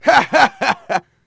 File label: hehheh